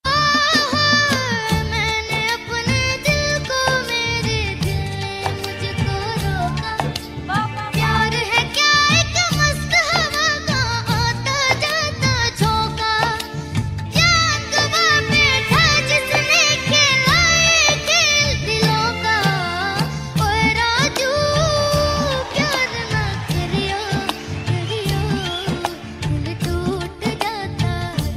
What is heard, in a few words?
Sad Ringtone